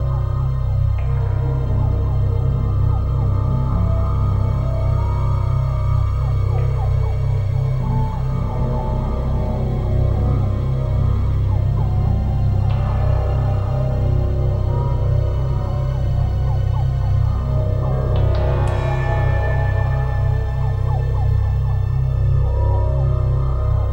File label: sounds music; Halo Combat Evolved; Xbox